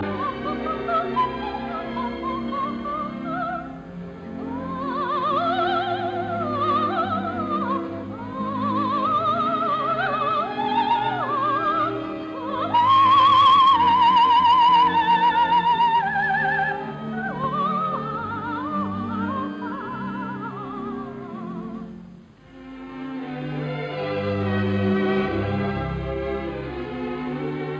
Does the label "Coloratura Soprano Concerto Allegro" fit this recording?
Yes